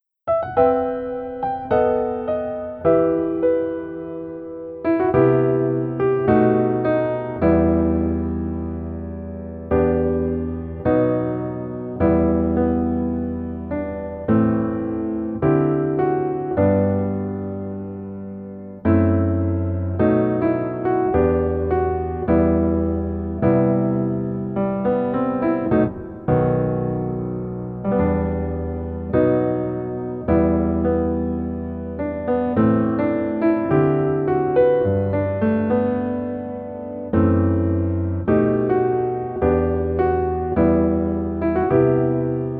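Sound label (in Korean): Piano version -1키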